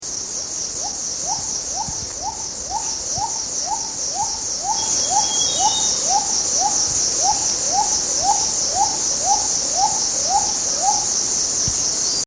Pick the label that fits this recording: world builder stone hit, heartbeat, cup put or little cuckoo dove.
little cuckoo dove